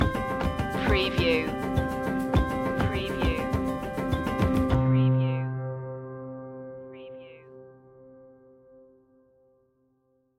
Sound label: roots stinger